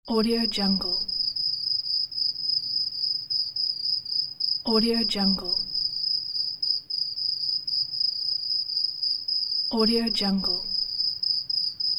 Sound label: cicadas at night